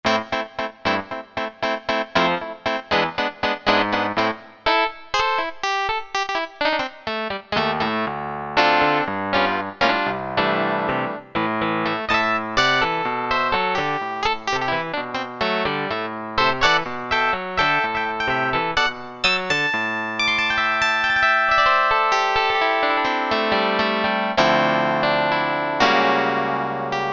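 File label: 60's Electric Piano, Roland SC-50 Sound Canvas; Factory sounds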